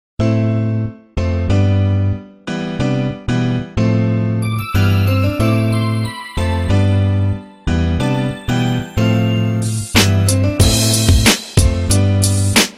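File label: oriental instrumental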